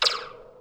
alien shoot; Space Shoot

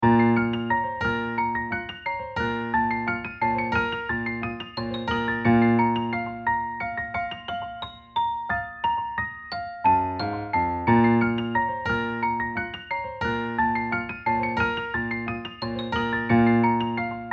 marionettes piano

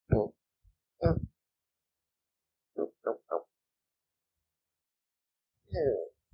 little grunts, I made these noises in my sleep

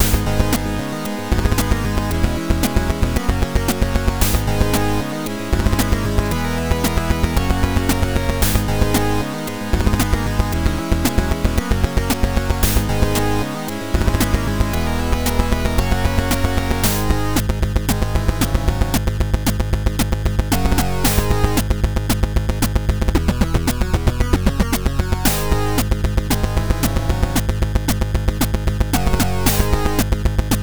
Boss Battle

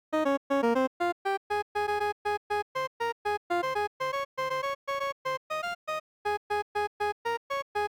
Melody

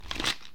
paper sound

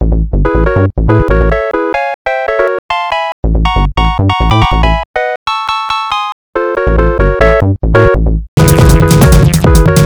boss fight bgm; A boss fight BGM.; Can be used in RPGs and platformers!

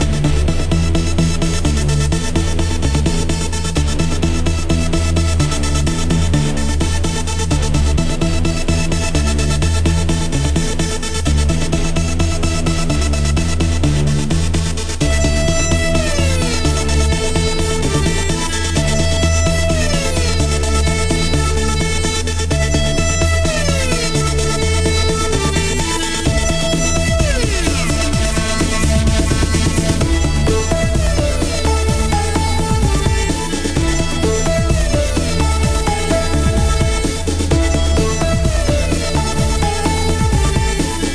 robotic

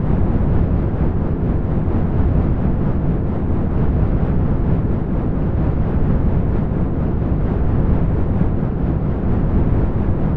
generator loop, Steam boiler sound loop; specifically Image-Line's Ogun, which does some nice metal type sounds

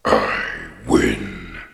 win

